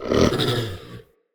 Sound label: Corrupted Battle Dog Stun Start; Animals; Vocals